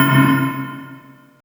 POLYFUSION, ODD BELLS; Spectrasonic Distorted Reality 1

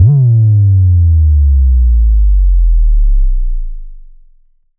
Sub Kicks, Bassdrums; Vengeance; Essential Clubsounds Vol.4

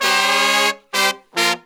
Phantom Horns, 132BPM; Zero-G

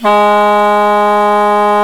THAI PIPES, World Combo

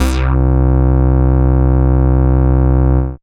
Moog Bass, Dance Synths; Best Service ProSamples vol.37

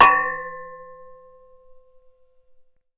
Radiator, contact mic, Metalics

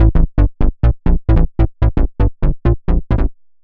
Bass 132 A, uk garage

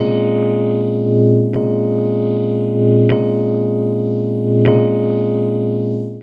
Chords Tones; Equipped Music; Loungin House

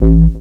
bass techno; Ueberschall; Techno Trance Essentials

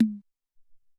Sin Pop

